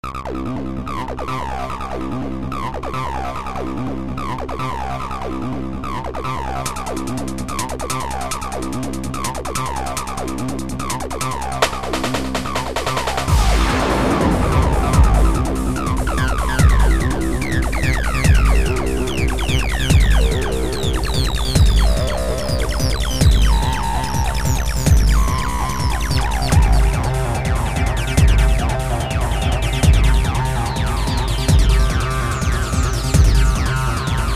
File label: techno